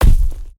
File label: footstep; smite; atlas skin3